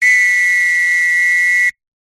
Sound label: whistle two tone single long blow close up